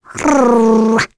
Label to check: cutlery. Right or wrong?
Wrong